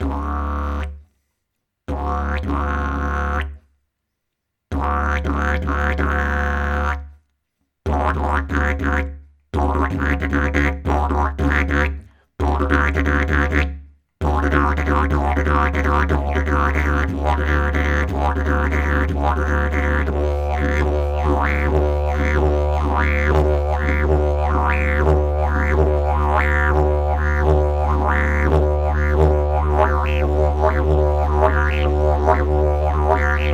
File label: didgeridoo; jasan stromorost; 33 mm; Ladění : D; Tomáš Dufek